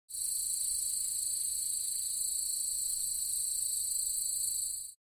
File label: Cicada chirping